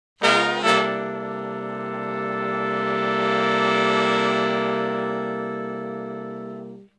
Shf Blus Thick End Chds [bII, I] (C), Horncraft for R&B; Sonic Foundry (Sony Creative Software); Sections